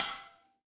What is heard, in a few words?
impact metal hit